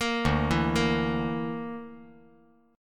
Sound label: D Suspended 2nd Sharp 5th D